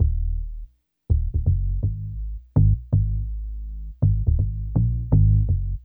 BSE, Hip Hop and R&B Drumloops; Best Service; ProSamples vol.01; AKAI

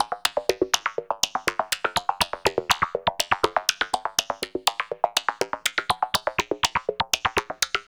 LOOP, Tekno Industrial; East West

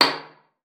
VIOLIN; Xsample VOL-5; 90 s Sample CDs; VIOLIN FING

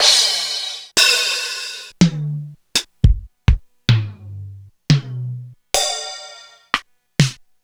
Korg DSS-1

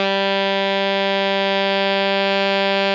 ALTO MF G 2, SAX; Greytsounds; Philip Wolfe David Crozier's Saxophones

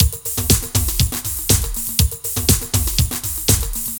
Drum Loops

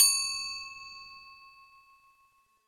STEEL DRUM

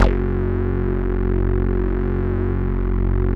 CS-15, BASSES

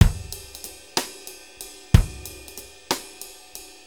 6-8 Loop, Full Kit Loops; Drum Tools

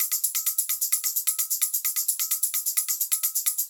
Live Percussion, 130 BPM

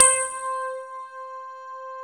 piano C 3; AKAI S-Series CD-ROM Sound Library VOL-8

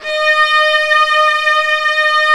Vla D#4; Digital Sound Factory Virtuoso

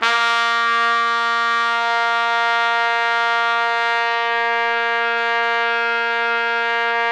A#2, Pop Brass; Best Service ProSamples vol.19; AKAI

